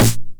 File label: 909 SD Tape Sat